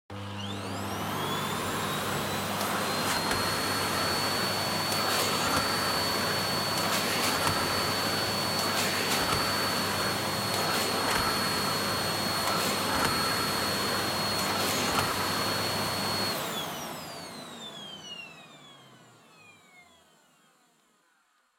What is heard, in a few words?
Vacuum Cleaner Vacuum Floor Soft High